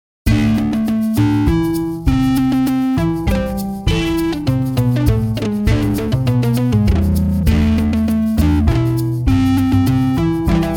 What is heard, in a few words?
Polska